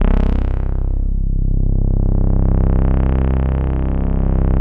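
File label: SD1 synth horn, Synthesizers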